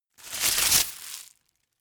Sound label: tear